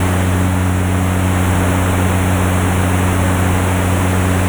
electronics hum

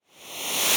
reverse whoosh

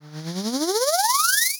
riser tension

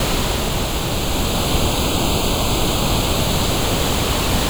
server room

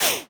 whip pan

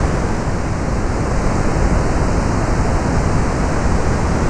room tone

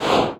cloth sweep

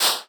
paper swipe

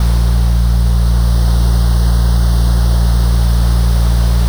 tension bed

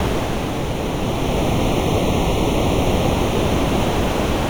city distant

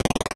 glitch stutter

